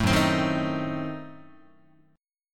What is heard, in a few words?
F sharp Diminished 7th, down, Guitar; strummed; 0,2,3,1,1,2; 4A,4E,5A,5D,5G#,6C#